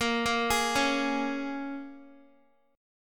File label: Bb Minor 7th Bb, Guitar; Bbm7 arpeggiated